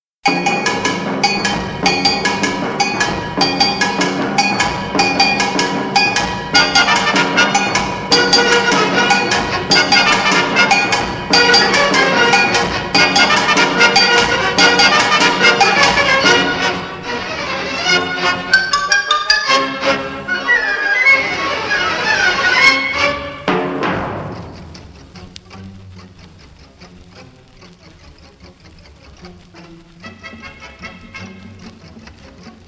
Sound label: CONGA